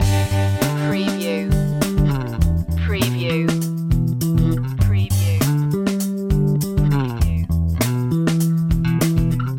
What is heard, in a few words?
happy tune loop